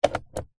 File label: vehicles suv centre console compartment plastic lid nissan patrol 2019